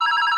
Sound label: Phone Ring; Viewfinder